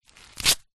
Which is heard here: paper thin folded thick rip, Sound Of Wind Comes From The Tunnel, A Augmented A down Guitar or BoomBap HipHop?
paper thin folded thick rip